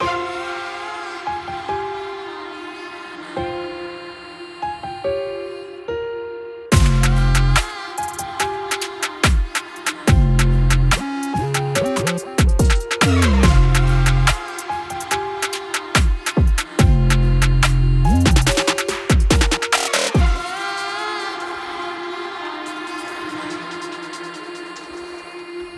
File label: uk drill beats